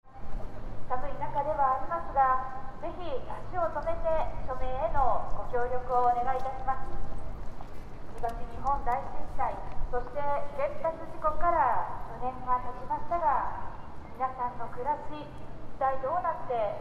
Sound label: fukushima station